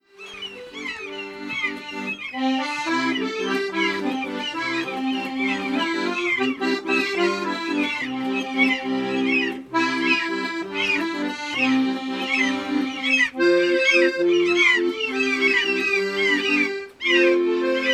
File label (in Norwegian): Trekkspill med maaker